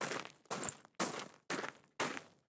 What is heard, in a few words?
Footsteps Gravel fast; BLIND QUEST - The Enchanted Castle